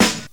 hip hop snare sample g key, snare drum sound tuned to the G note